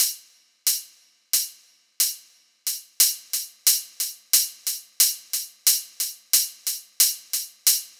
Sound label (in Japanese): 裏拍; guitar metronome